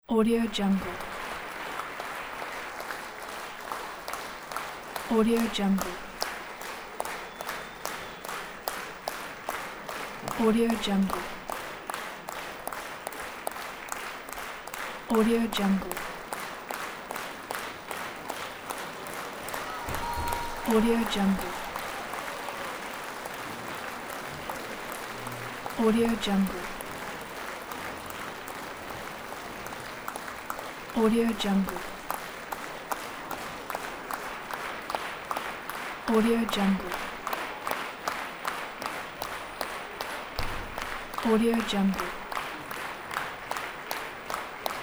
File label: rhythmic applause; angwell